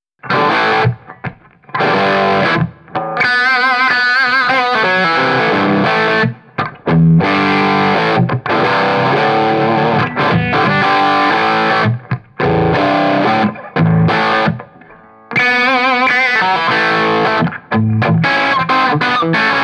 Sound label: Rattler Les Paul; jam pedals